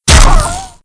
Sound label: Teleport Flash; Playable Tutorial Level